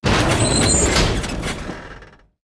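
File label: Upper Elevator Away Top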